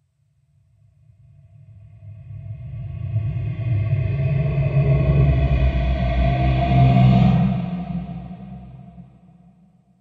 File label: Deep Space Demon, Alien Sound Effects